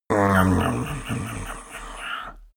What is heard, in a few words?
male grumble comfortable; menschen; koerpergeraeusche; soundpool